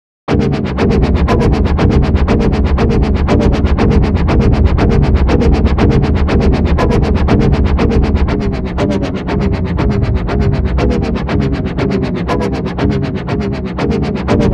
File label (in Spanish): Cortina electrónica; Segundos mensajeros.2022